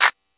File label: DP50 Clap, Technics PCM DP50